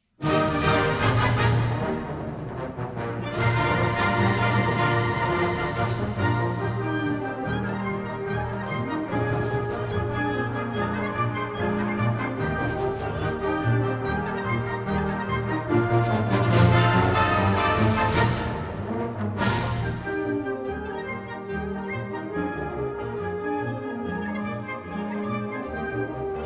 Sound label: Wind Symphony; The Ocean Springs High School Wind Symphony